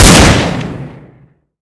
deagle, weapons; cstrike

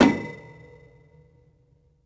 ladder; cstrike

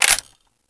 galil clip out, weapons; cstrike

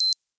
c4 click, weapons; cstrike

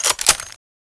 m249 cover up, weapons; cstrike

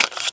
aug clip out, weapons; cstrike

